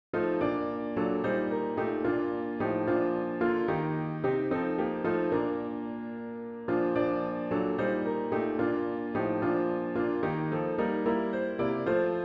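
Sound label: Hymn piano with Intro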